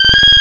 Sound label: Spider Monkey; Cymatics; Serum; Animals for Serum - Gold Edition